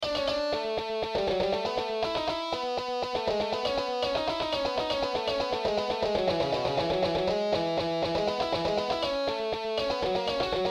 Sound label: Hornpipe, Shred Version